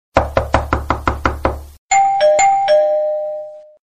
Knock ring

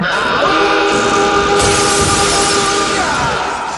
Klaxon

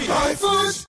low; ifood

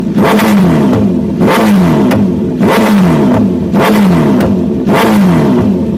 CBR1000RR revving

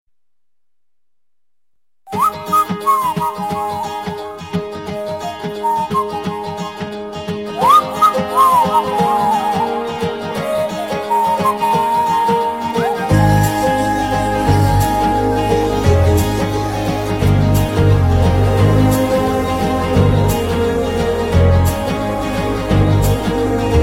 Azhaipaya Music

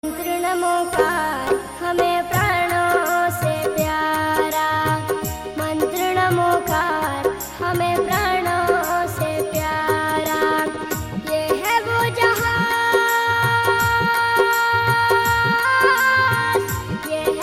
Mantra Namokar

